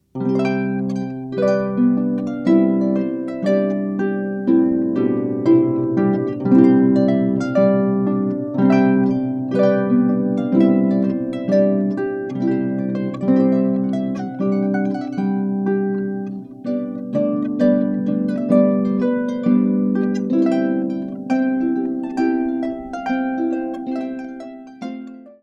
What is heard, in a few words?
Trumpet Tune